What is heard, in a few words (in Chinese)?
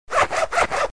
刀割腋毛